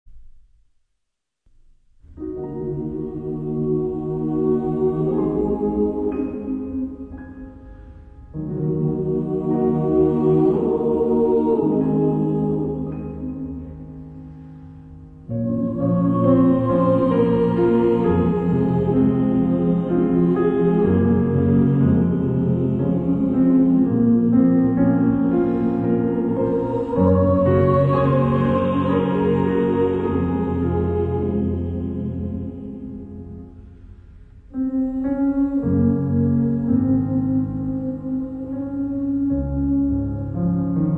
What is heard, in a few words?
piano; Christoher Glynn